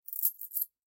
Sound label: Chain Foley; SoundsCrate